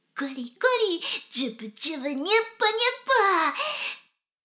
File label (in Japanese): しこしこ音声; 少佐殿; 牧場戦腺